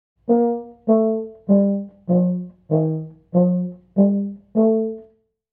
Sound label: c staccato